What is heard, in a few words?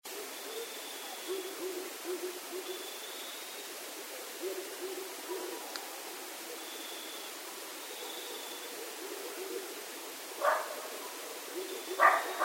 Coyotes; Coyotes (Canis latrans); night sounds